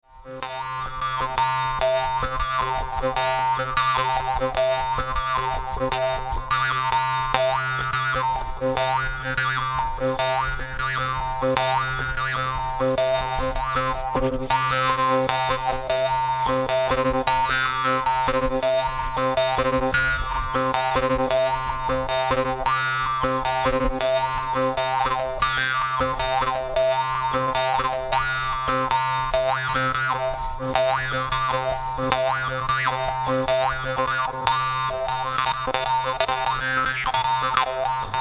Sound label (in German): Mondharp